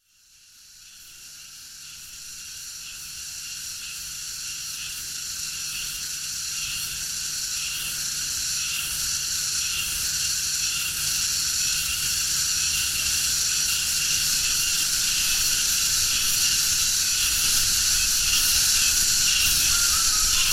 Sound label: cicadas bird and dog